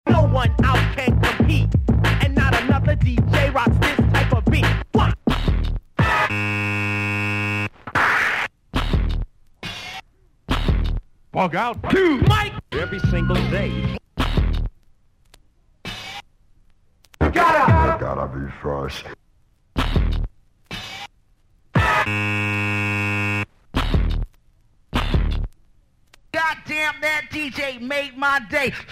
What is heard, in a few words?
piano joint with vocal effects